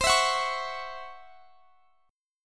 Db Minor Major 7th Double Flat 5th C

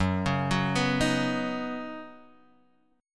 D sharp Minor 7th Sharp 5th F sharp

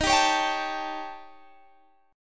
Eb 7th Sharp 11th Eb; strummed

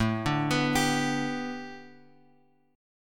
A 9th Suspended 4th Bass Standard, chord; A9sus4; arpeggiated; JGuitar

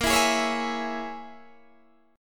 A sharp Minor 7th Flat 5th A sharp, Guitar DADGAD

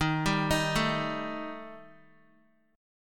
D sharp Major Flat 5th, Guitar; D#Mb5 arpeggiated

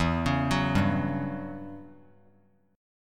E Minor 6th Add 9th chord; Em6add9 arpeggiated; JGuitar

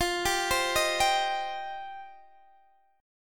F 7th Suspended 2nd, Guitar Open D

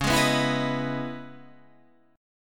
Db Minor 9th, chord; strummed; JGuitar

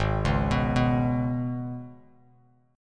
Ab Minor Double Flat 5th down Bass Standard; Abmbb5; arpeggiated

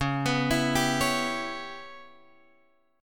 C sharp Minor 7th Flat 5th C sharp Guitar Open F; C#m7b5; arpeggiated

